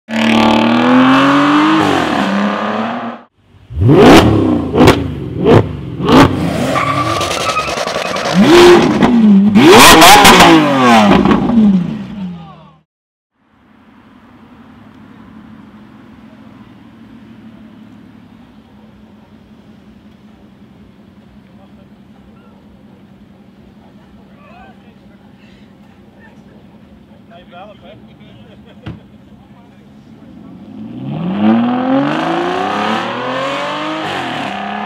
Aston Martin Rapide

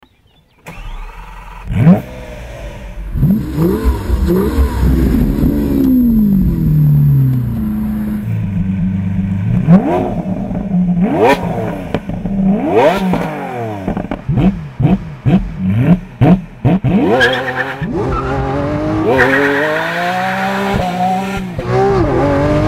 Lamborghini Aventador Roadster

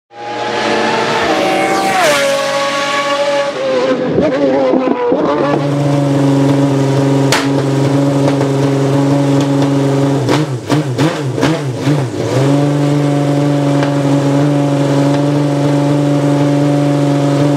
Zonda Revolucion; cars

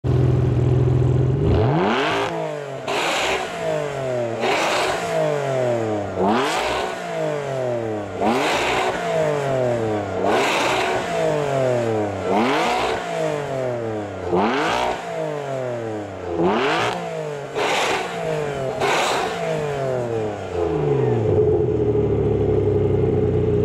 Ferrari 612 Scaglietti

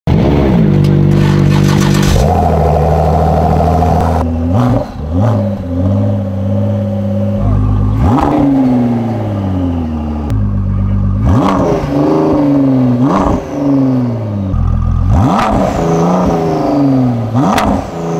Ford GT

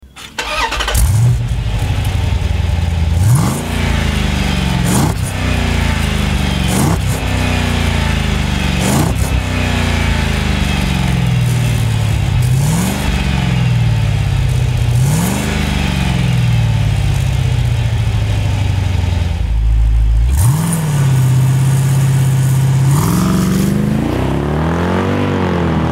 Jeep Grand Cherokee

